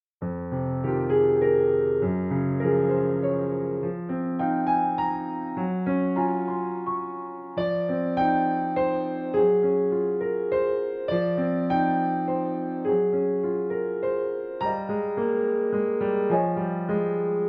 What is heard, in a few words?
Duet